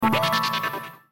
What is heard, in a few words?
Jingle Menu; Picross